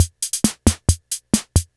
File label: Casio MT-45 Pops